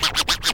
scratch

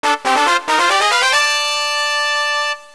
fanfare

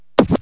scratch

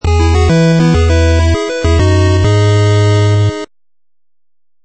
gameover

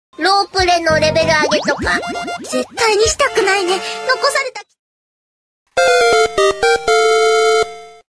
Level UP

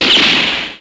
pistol

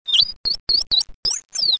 Cry

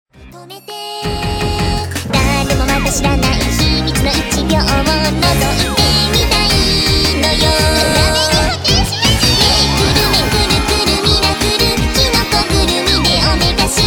bgm

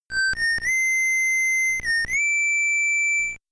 whistle; rockman7; blues

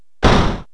door close

